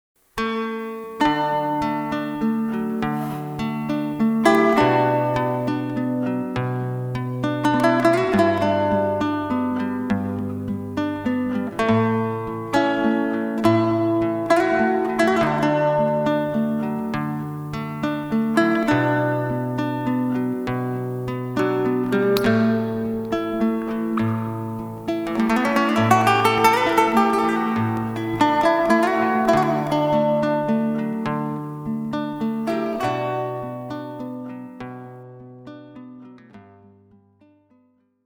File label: Guitar